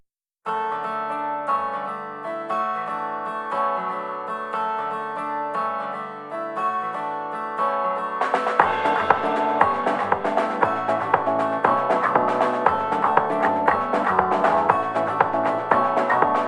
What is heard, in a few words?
guitar